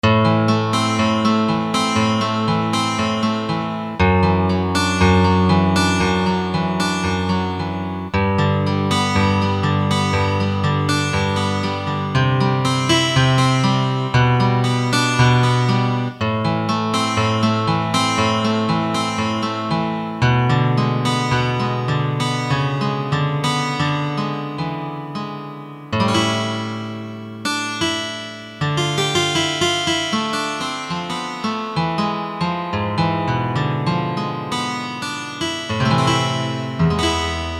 GUITAR

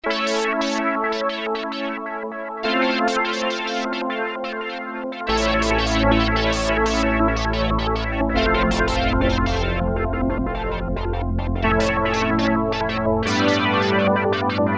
Roland JX-3P, Pulsar

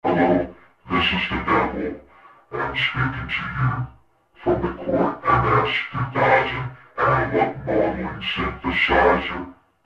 Korg MS2000, Vocodevil; Factory Patches

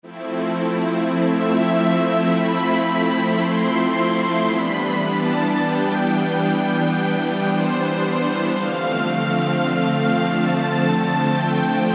Yamaha TG500 SP wind

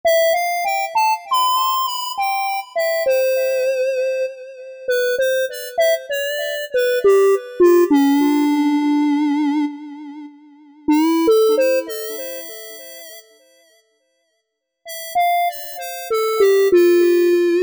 Future Flute, synth leads; Apple GarageBand 2

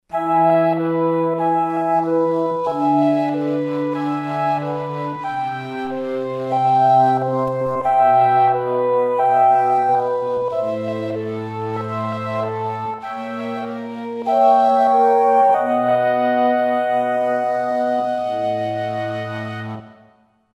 Roland V-Synth; Vellatron Fl; Factory Patches

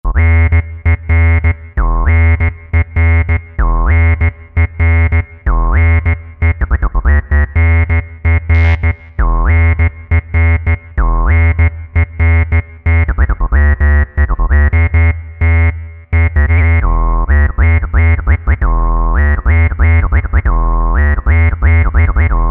DB Sub

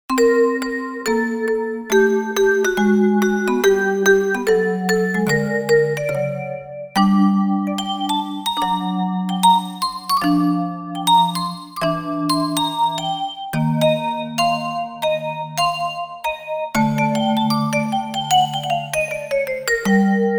Super Vibe, Roland JV-880; Factory Patches

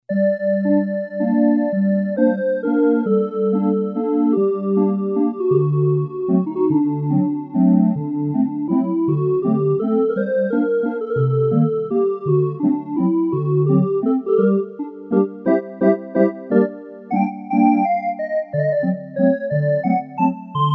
Organ 3, Casio CSM-1; Tones

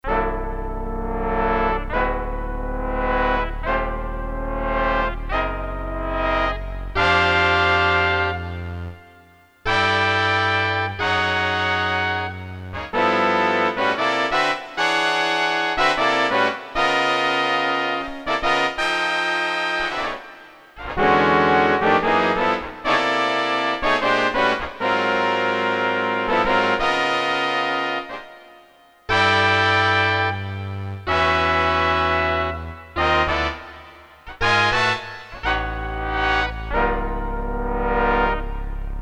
BigBand, Brass Hits; Korg DSS-1

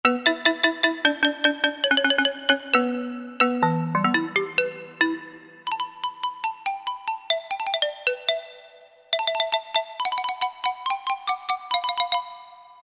Xylophone

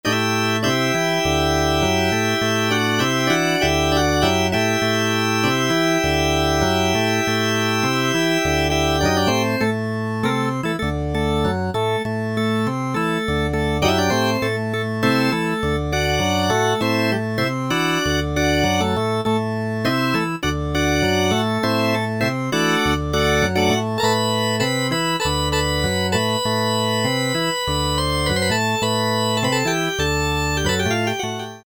Vox

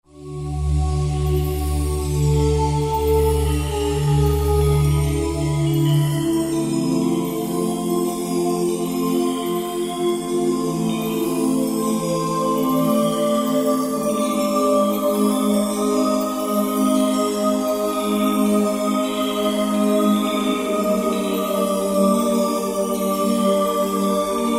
Belltree Vox, Roland JV-2080

